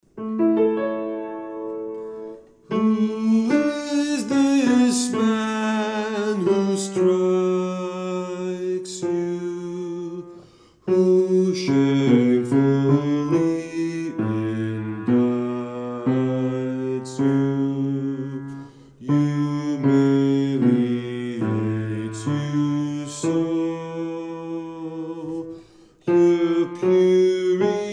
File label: Bass 1st verse